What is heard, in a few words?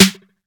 Loud Snare Sample G Key, acoustic snare tuned to the G note. Loudest frequency: 3096; snare drum samples